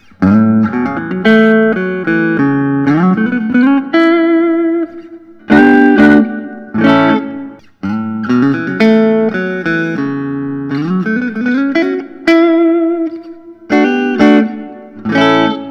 fender custom shop 52 telecaster relic

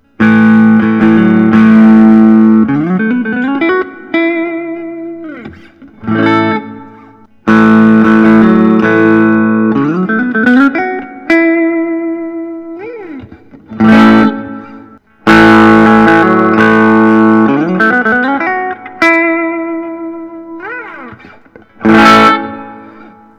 gibson memphis 63 es 335tdc block

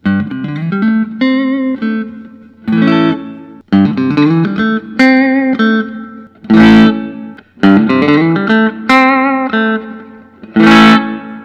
thorn g t blackguard

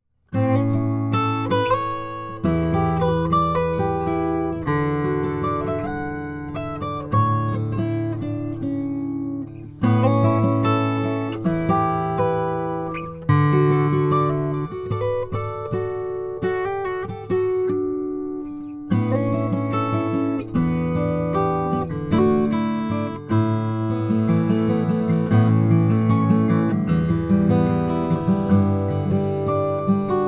olson indian rosewood; 2008

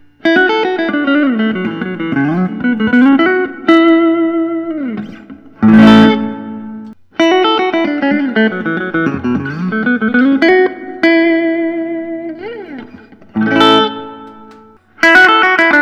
PRS hollowbody spruce; dark cherry

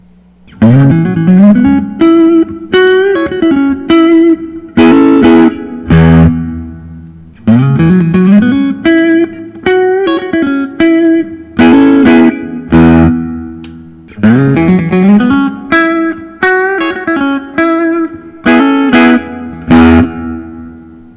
fullerblaster tv yellow; johan gustavsson

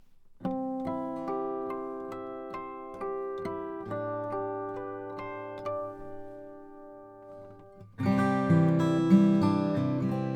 mcalister om 42 brazilian rosewood

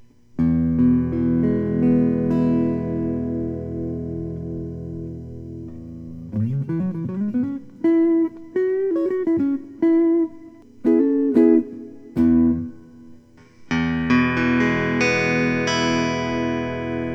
fender telecaster rw fb; olympic white

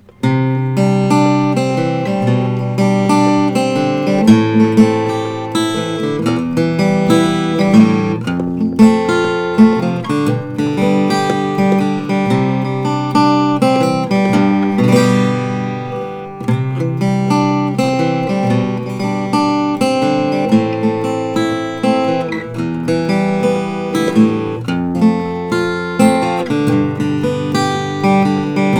gibson lg 1 sunburst; 1963

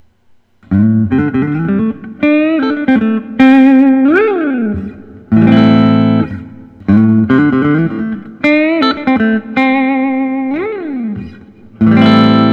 paul reed smith private stock

